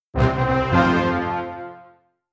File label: Level Complete; Matoran Escape; Flash Games; Game Music